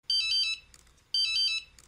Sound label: SMS